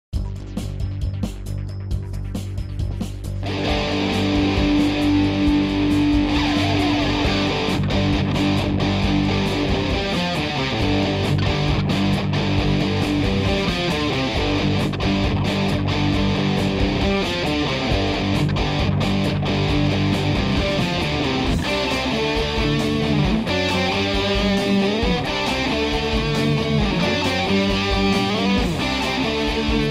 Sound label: Guitar; Coraline The Video Game; Wii PS2